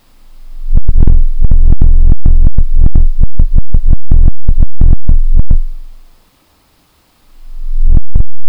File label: square zoom